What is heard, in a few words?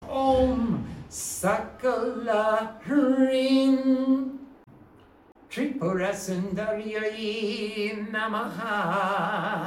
Maa Tripurasundari Mool Mantra; maa tripurasundari sankalpa